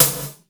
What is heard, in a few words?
BG SNR, METALIC DRM; Crypton - DrumBase5000; METALIC DRM2